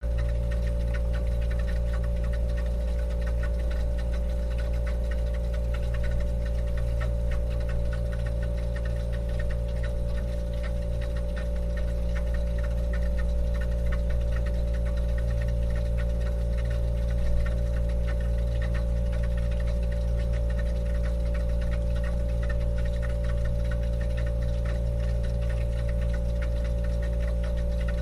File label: Ambiance Machine